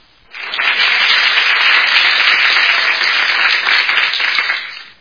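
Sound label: applause of a group of people with shouts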